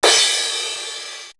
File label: Perc; AMG; Perc One Shots